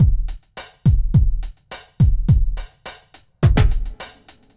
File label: eastcoast shuffle 105